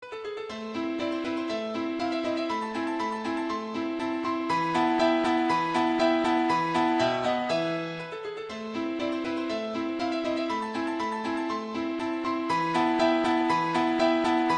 Mozart Türk Marşı

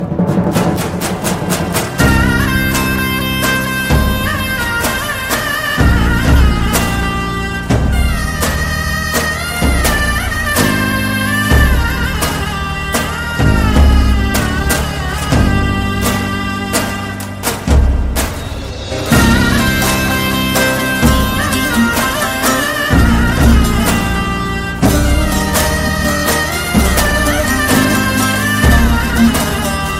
Cokertme zeybek

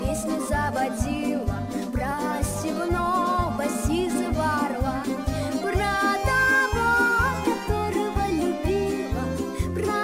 Rus Halk Sarkisi, zil sesi

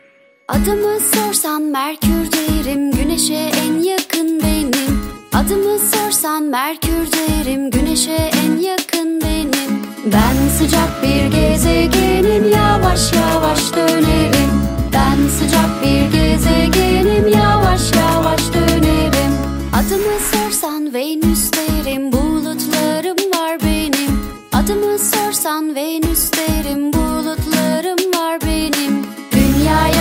Eğitici Çocuk Şarkıları

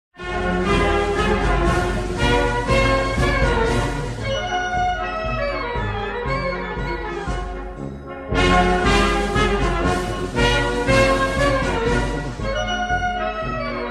Marslari zil sesi; TSK

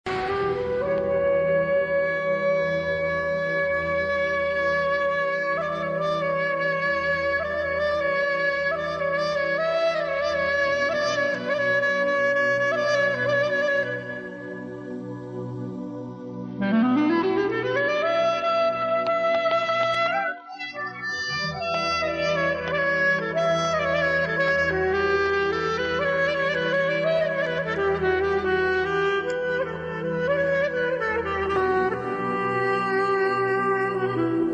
Duygusal Müzik Klarnet

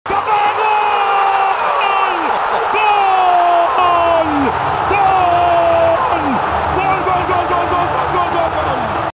Kafa Gol

